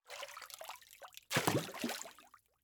Liquid Water Water Steel Cup Dunked Water

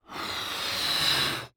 Cartoon Balloon Blow Up; AdobeCC

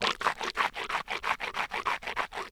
Human Male Rinses Mouth With Water

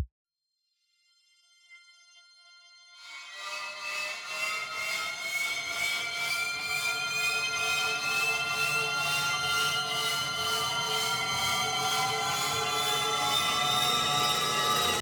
RISER, Cinematic Sound Effects

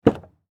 Transportation Car Monte Carlo Open Door Exterior

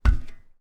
FOOTSTEP - Metal Plate Walk Barefoot Male; Barefoot on Metal; Timothy McHugh; GDC Game Audio Bundle

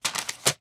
Foley Paper Unfold Fast

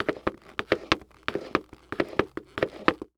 Foley Footstep Cleats Walking On Cement; Foley Footsteps

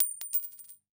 Impact Coin Quarter Dropping On Cement

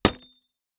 Impact Board Wood Hit By Large Metal Pole

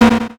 Digital; Adobe; Multimedia Internet CD-Rom Flash

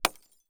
Crash Glass Break Small Light Bulb; Crashes

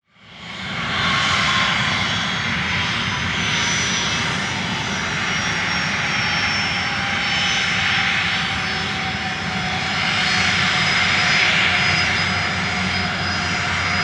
Airplane Jet Idle; transportation

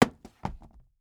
Household Book Hard Cover Dropping On Cement

